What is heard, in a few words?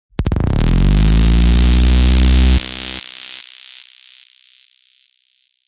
rip bass; REMIXABLE COLLECTIONS; Kraddy Collection